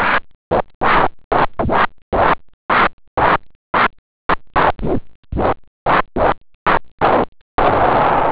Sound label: SAND; OctaMED SoundStudio V1; scratch